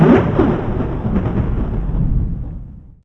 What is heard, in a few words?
THUNDER